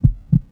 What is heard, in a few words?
bullet heart; Tony Hawk's Project 8; XBOX